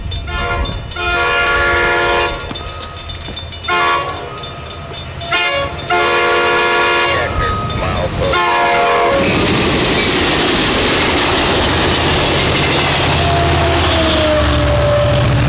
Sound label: 68mph, trains; eastbayrails